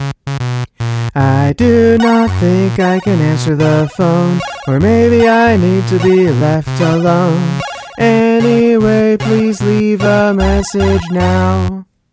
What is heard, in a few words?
answering machine